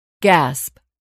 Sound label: gasp